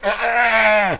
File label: Ogre Die